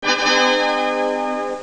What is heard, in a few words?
HOORAY